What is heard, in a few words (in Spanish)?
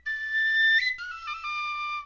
flauta